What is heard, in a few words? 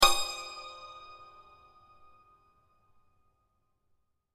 santor mi